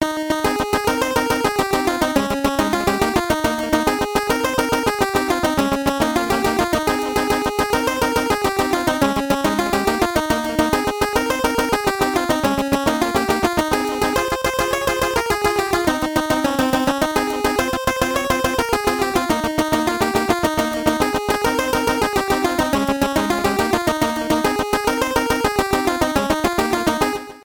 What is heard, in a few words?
Irish Dance; 1001 Spikes